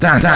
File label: dance